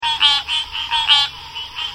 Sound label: ambient; survival